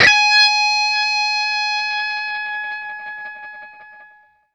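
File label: SINGLE G sharp 5, Vintage Blues Guitar ELEC.GTR; USB Soundscan vol.22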